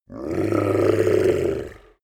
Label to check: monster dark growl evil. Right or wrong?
Right